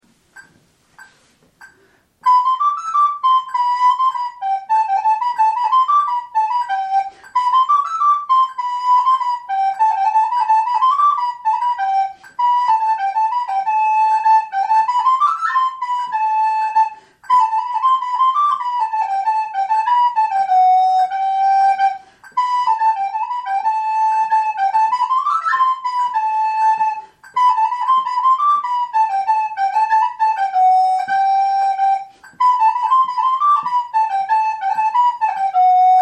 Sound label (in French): Gavotte, FLUTE; caroubel